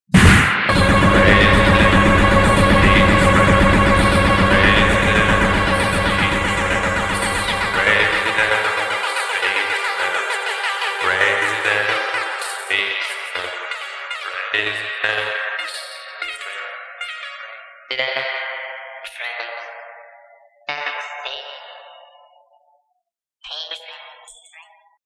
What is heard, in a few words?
Jingle